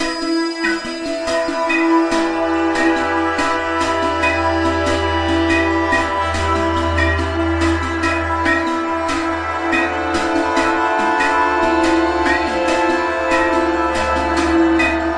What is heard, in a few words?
lush sitar droplets pad